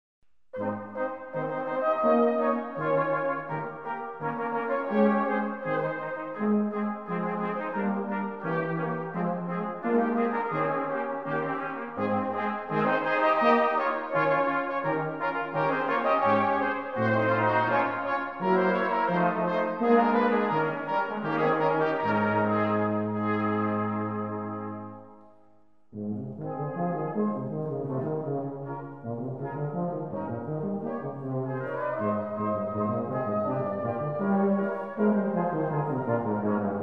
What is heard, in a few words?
Brass Polka